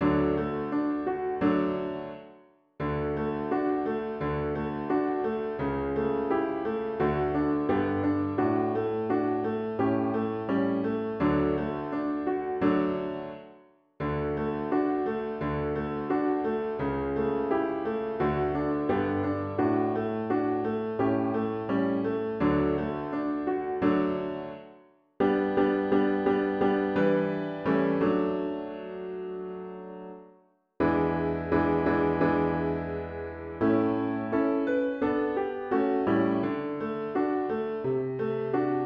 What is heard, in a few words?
piano; 2권 Old Song- DAISY DEANE